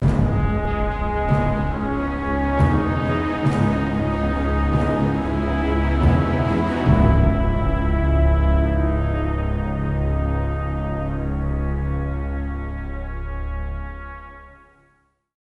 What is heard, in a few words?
Death